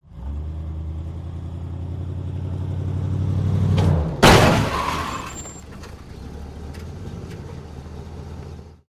Drop Impact Moving Vehicle Collides With Stationary Vehicle, R To L